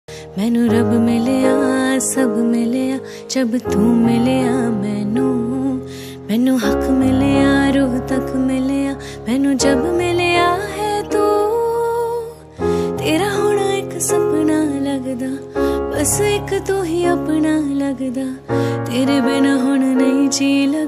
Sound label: female hindi ringtone